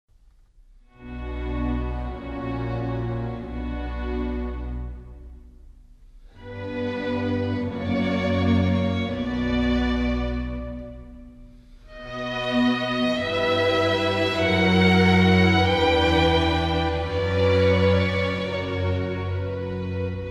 Sonata For Trumpet, Strings & Continuo In D Major - Adagio